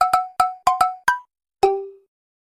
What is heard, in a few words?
Fanfare; Luigis Mansion; Mario